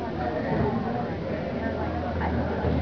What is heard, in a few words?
murmur!; PatsUniverse2.2